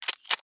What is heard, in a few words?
Reload